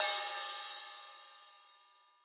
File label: Hi-hat; Percussion; 7rystan's 600+ FREE EDM SAMPLES & DRUMS